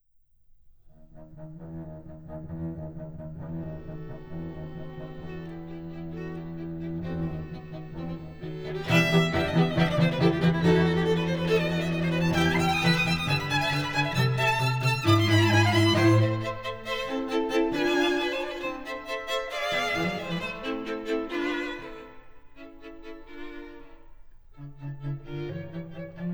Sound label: String Quartet Test; kimber kable Isomike Tests 2005b